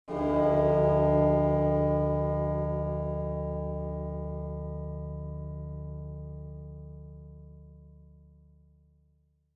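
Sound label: ambience bells; Blackfaun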